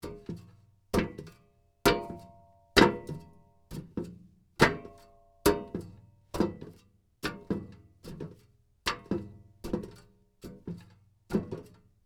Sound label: Human Crawling Through Metal Vent Long